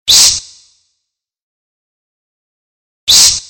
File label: psssssssst